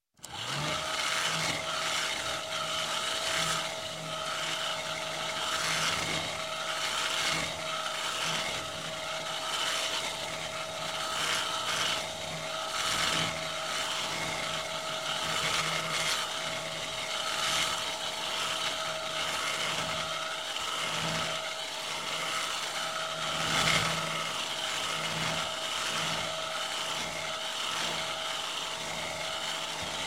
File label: Hair, Dryer Drying Hair, Barber Shop, Hair Salo; Hair Salon; Sound Ideas 2000 Series